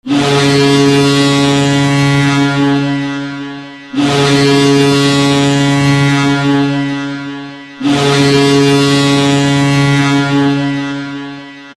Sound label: goal horn